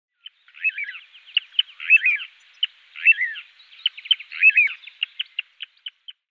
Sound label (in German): Flussregenpfeifer Charadrius dubius; Vogelstimmen